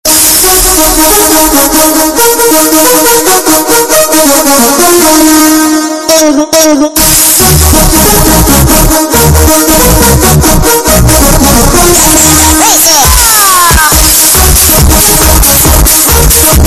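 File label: frog bass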